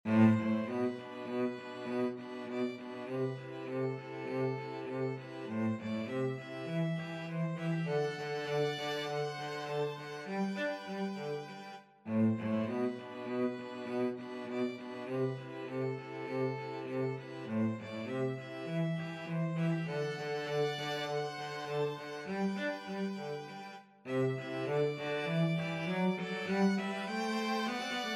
string trio

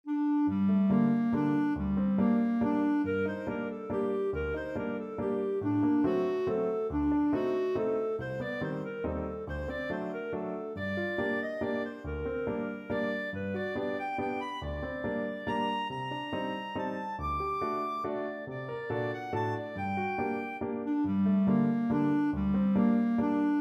Swallows Waltz, clarinet

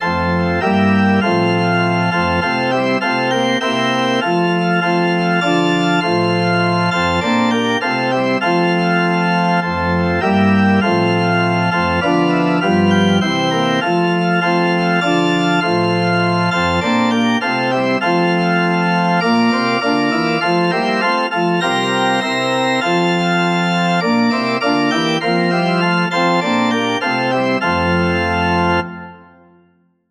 once in royal, organ

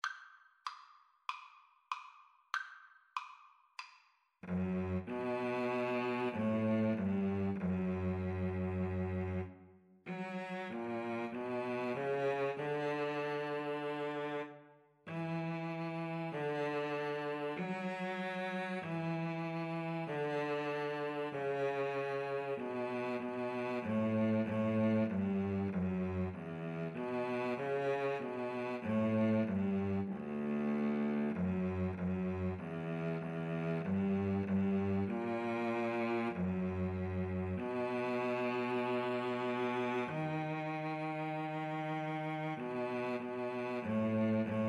Cello Duet

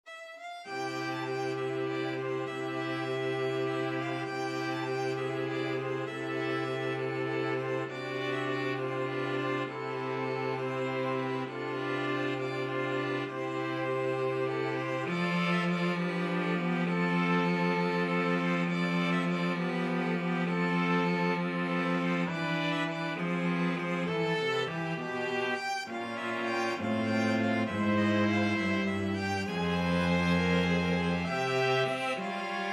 flexible mixed ensemble, 4 players

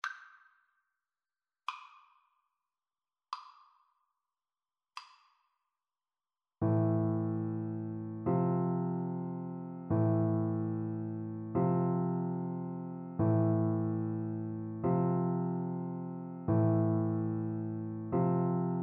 Oboe; chopin funeral march

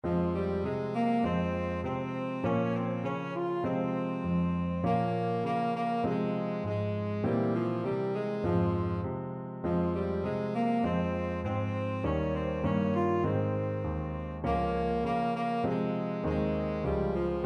tenor saxophone

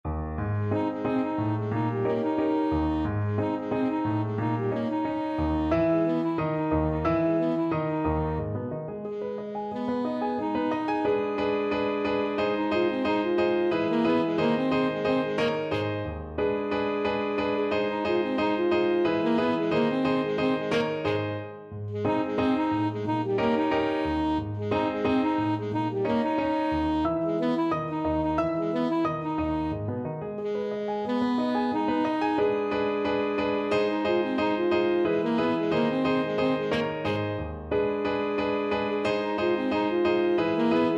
saxophone

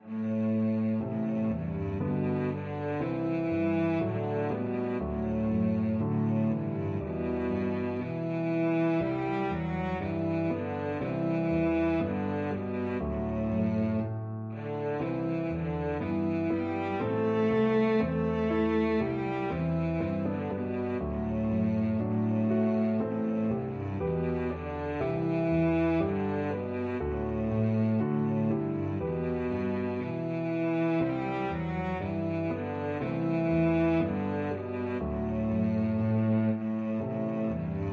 cello

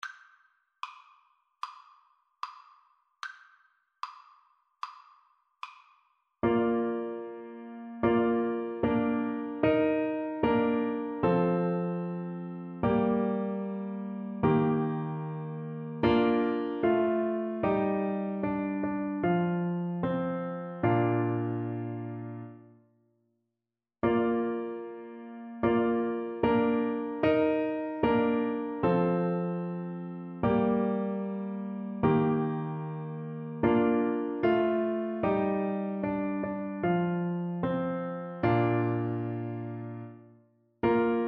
lo how a rose, Viola

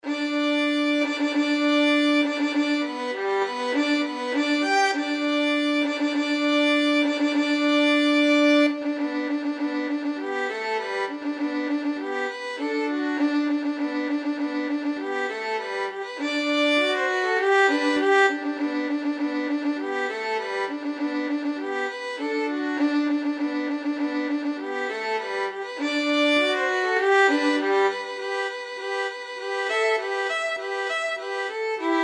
rossini william tell, violin duet

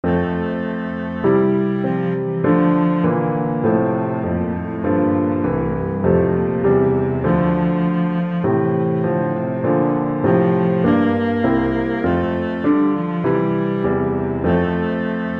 hornpipe, cello

